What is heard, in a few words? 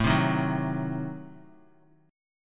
A Diminished 7th A down Bass Standard; Adim7 strummed; JGuitar